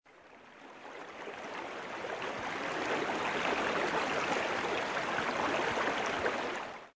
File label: river; ruidos